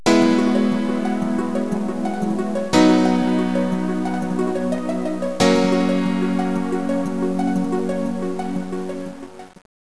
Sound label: jingle